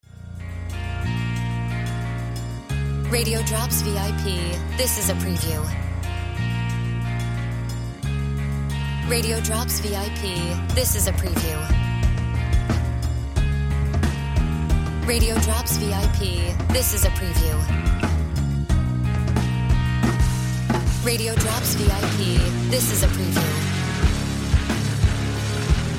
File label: Music Beds